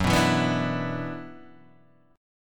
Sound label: Gb 7th Suspended 4th Sharp 5th, Guitar DADGAD; chordshape; down